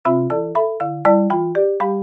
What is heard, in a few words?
marimba ringtone